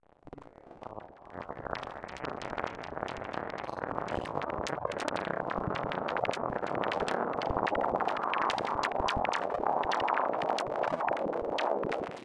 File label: Glitch